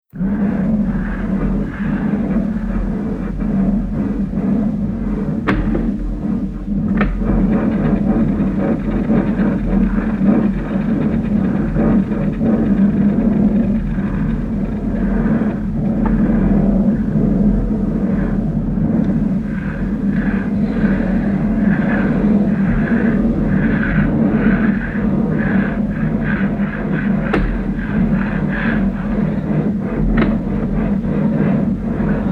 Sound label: stereo sounds